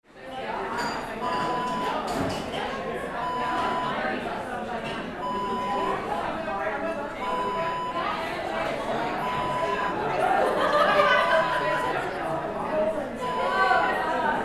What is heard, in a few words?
bar ambience